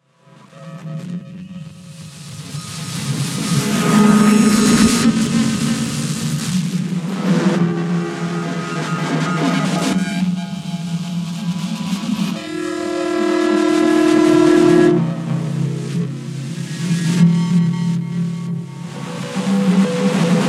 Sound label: Interference Field Transmission